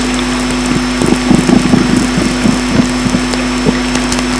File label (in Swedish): långdragna grymtningar; Vattern; expeditioner norra Vattern